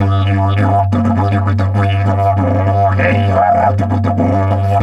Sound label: DIDGE 100BPM, Didgeridoo